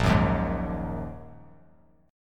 A sharp 7th Suspended 4th Sharp 5th, down, Bass Standard; strummed; chord; JGuitar